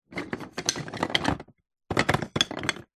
leisure toy wood bricks movement rummage; zapsplat

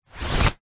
foley flag movement hands pull through; zapsplat

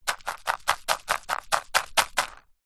food dried chillies toss in plastic pot; zapsplat

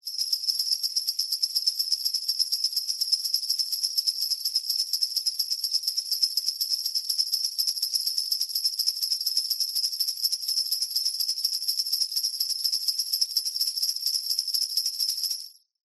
musical sleigh bells shake fast

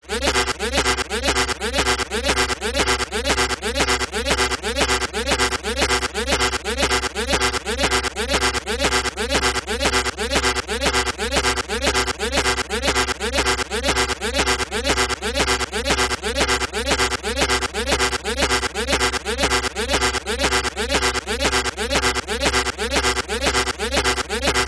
science fiction alarm warning buzzing medium

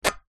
metal pole hand squeak as if let go; foley

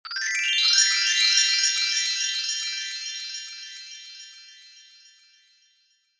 twinkling glistening glittery fairy dust sprinkle; zapsplat

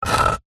office pen biro draw line on wood

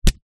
impact head hit ground thump

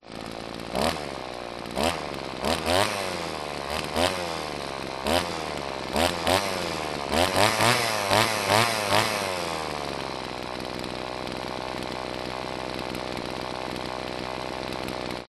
household whipper snipper strimmer 2 stroke rev

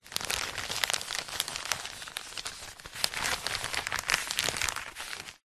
wrapping paper handling movement; zapsplat; foley

